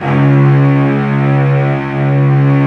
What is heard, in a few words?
cl C#1, Cello Section, AKAI S-1000; Retro Sampler